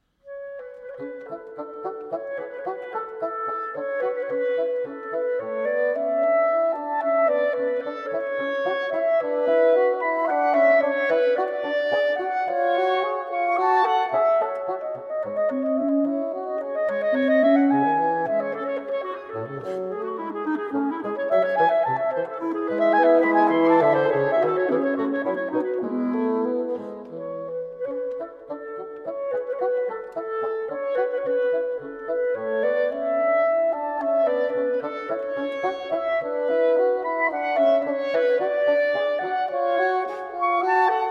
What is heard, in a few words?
Wind Quintet, Menuet